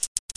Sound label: coin; Sexy Games 3; poker